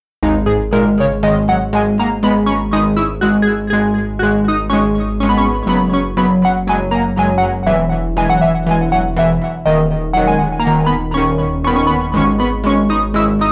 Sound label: electro bass sequence